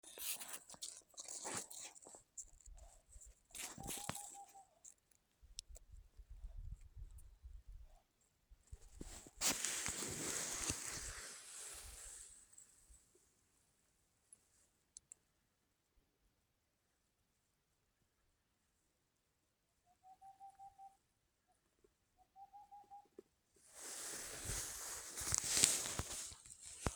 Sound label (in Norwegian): ugle kanskje